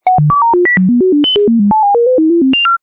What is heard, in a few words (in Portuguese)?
computador